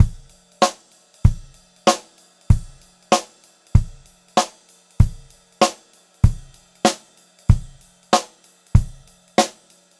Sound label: 96 CL VARI3, Pop-Rock Drumloops; USB Soundscan vol.37; AKAI; 90 s Sample CDs